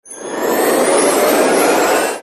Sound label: active, wheel